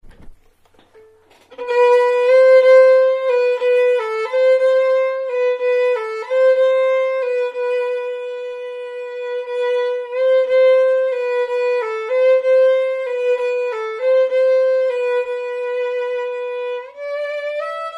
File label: Barcarolle; classical popular